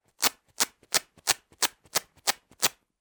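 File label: velcro sound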